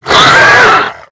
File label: Werewolf Roar; UltimateFishing2020